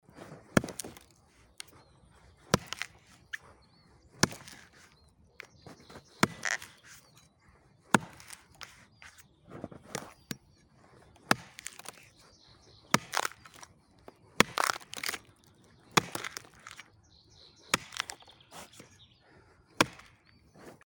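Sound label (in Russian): рубка бревна топором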